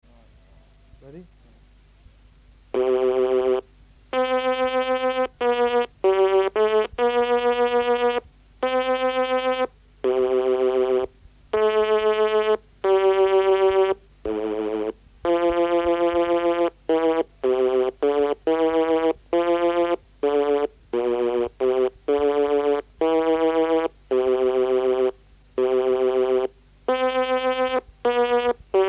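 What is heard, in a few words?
xylophone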